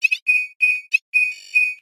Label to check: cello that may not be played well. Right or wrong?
Wrong